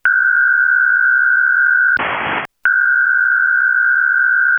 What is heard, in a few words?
FreeDATA; riojanosporlaradio; CQ